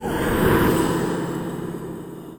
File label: death skull dying